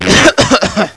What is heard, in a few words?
cough fart